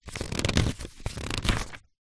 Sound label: household book large thick page turn finger thumb rub